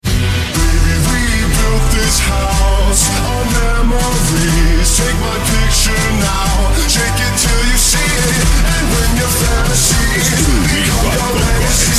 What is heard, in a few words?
sound car